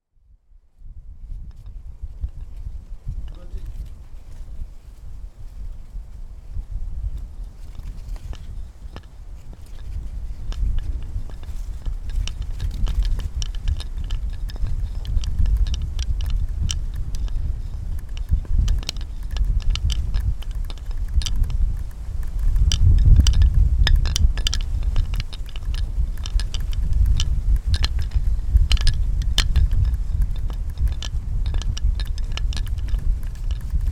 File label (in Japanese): ema; 絵ま